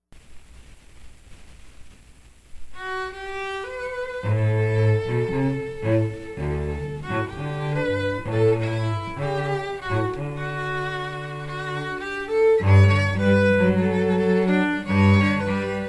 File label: strings